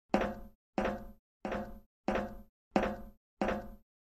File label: Walking on metal